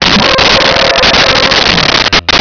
vox dewback

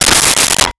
Plates Removing; Kitchen; 1001 Sound Effects

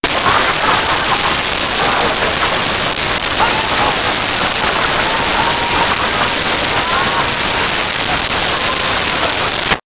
LAUGHTER CROWD; Rosegarden

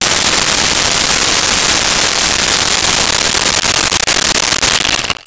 Roto Saw; Tools

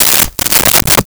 Duct Tape Tear, Foley

